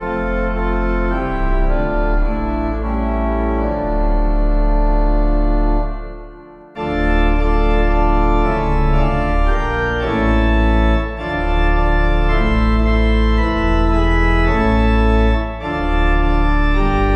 Forty Days And Forty Nights Aus Der Tiefe Heinlein Organ 5 verses; Hymnal 1982